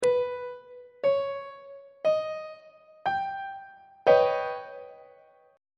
e flat augmented 7th 2nd inversion on treble clef

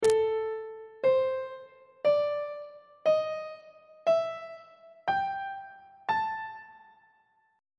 a blues scale on treble clef

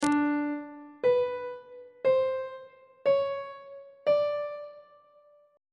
d 7th interval pitches on treble clef

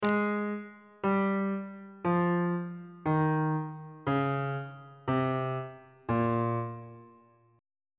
b flat dorian mode descending on bass clef

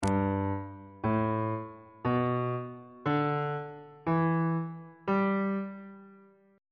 g major pentatonic scale

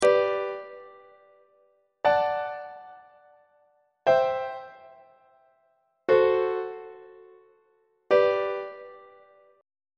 g major leading tone iac on treble clef

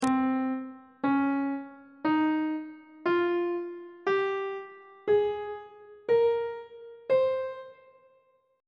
c phrygian mode note positions on treble clef

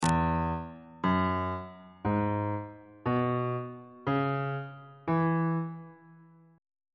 e major pentatonic scale on bass clef

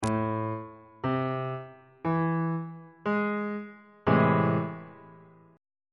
g double sharp minor major 7th chord on bass clef

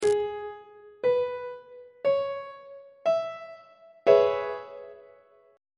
d double sharp major 6th 1st inversion; treble clef

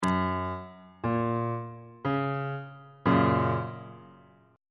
f sharp major chord on bass clef

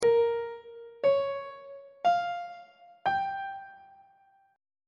a sharp minor 6th chord intervals; on treble clef

